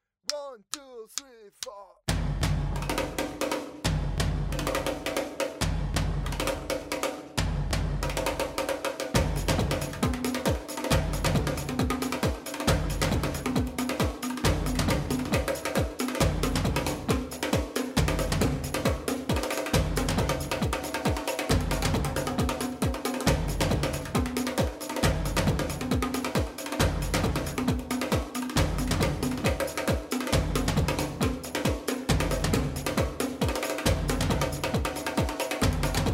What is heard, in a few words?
solo